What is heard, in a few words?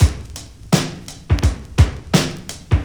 84 bpm 00s drum groove e key